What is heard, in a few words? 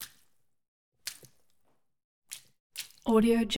efx int tea drink splash; collanderson